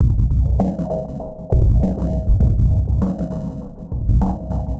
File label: Ghost Loop 100BPM, drum loop